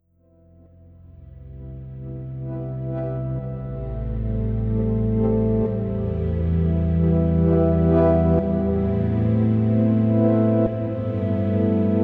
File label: Pads Strings